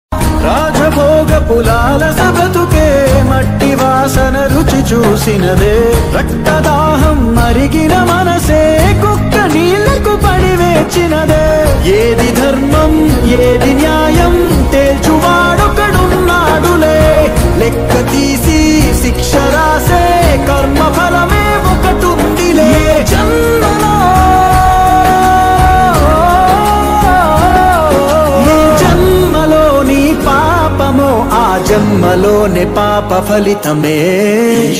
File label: telugu bgm; bimbisara